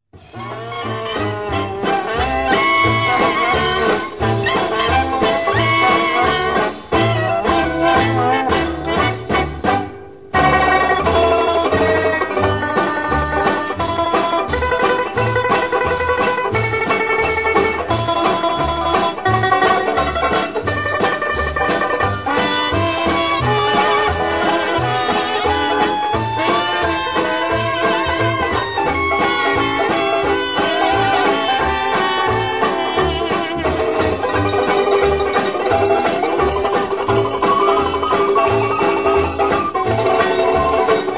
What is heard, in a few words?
Banjo